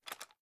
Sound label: rattle; tacz; daffas arsenal